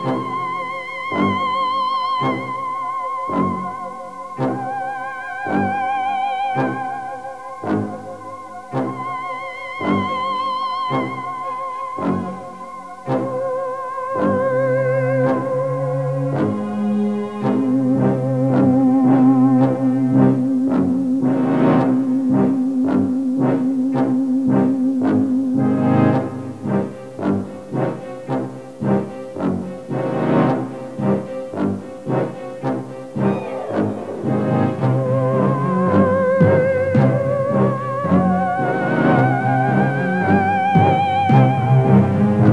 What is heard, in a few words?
Martian Style; 2:19 min.; RCT